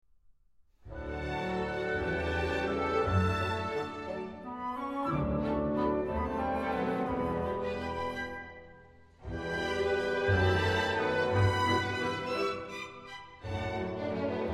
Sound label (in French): valse lyrique; les scandinaves